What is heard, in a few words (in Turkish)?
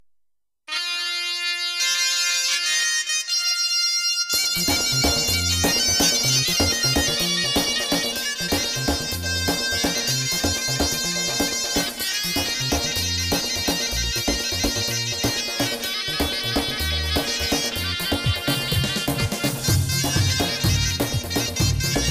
zurna halay; roland gw 8